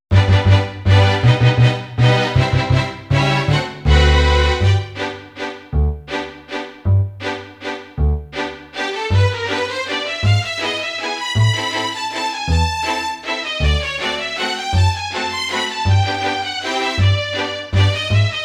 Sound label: dance waltz; harvest moon back to nature